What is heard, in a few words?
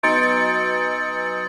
Grand Father Chime strike